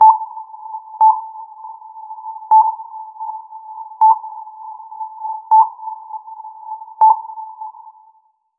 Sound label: u boat in space sonar pin, submarine